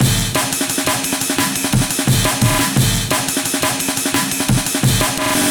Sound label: rolling snare amen break 174bpm F minor